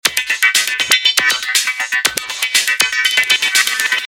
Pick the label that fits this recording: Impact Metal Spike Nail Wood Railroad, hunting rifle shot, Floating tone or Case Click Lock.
Floating tone